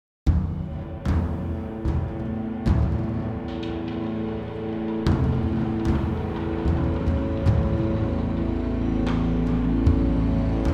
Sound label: Caves Slow; Yggdrasil